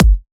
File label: treble rich kick drum single shot g sharp key